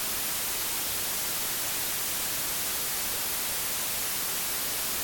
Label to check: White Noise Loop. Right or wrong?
Right